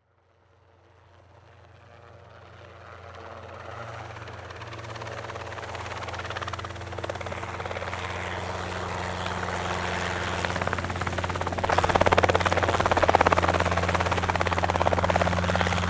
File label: Transportation Helicopter Landing Engine Shut Down